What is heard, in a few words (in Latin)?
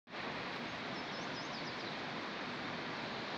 Phylloscopus rickettiv